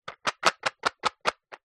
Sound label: leisure game travel plastic box shake; cluedo; zapsplat